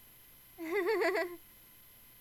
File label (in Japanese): ahahaha